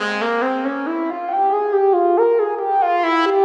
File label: Lead Smooth, Drum & Bass Keys; Sonic Foundry (Sony Creative Software); Knutrix Drum & Bass